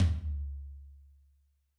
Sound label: Yamaha DJX 2B, Rock Tom